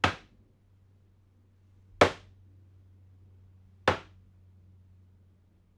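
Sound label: 3 hits drum courtyard rec main entrance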